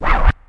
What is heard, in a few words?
Scratch, Ensoniq ASR-X Pro